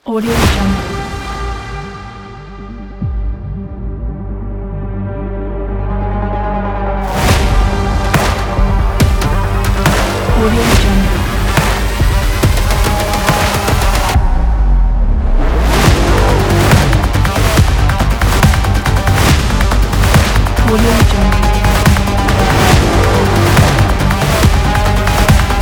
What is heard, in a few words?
cyberpunk trailer